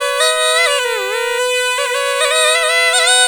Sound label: PUNGI